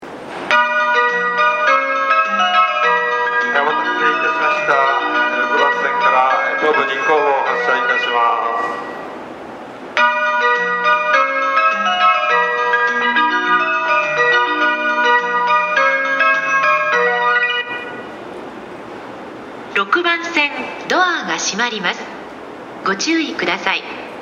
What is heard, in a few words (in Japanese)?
shinjuku hassya; 発車放送; 新宿